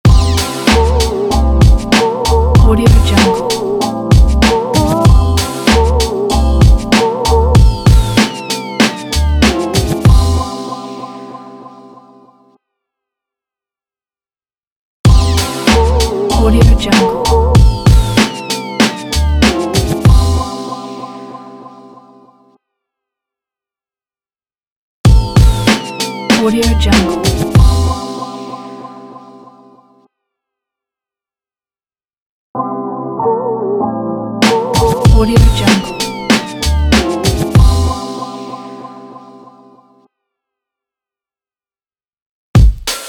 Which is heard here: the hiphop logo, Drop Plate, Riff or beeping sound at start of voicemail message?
the hiphop logo